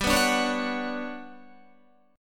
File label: G Major Sharp 11th, Guitar; Listen to GM#11 strummed; GM#11 Chord